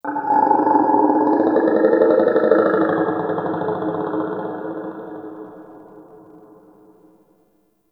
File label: Day Croaky Creature; Ambience; One Offs; Glades Of Andurin